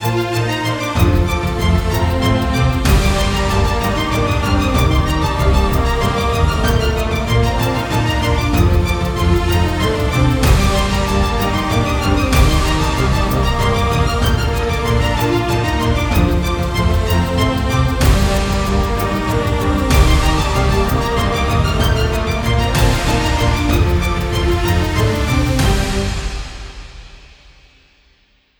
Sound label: cinematic; by burak sound bank; tension gerilim vol 52